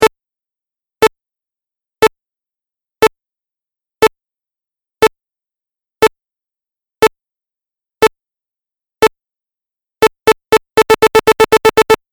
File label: Countdown Beep Tones; Beep Sound Effects